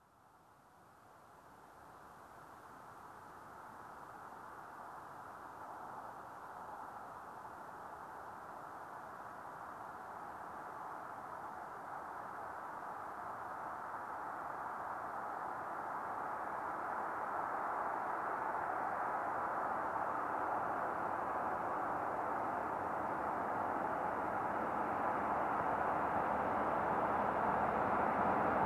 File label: Car approaching and parking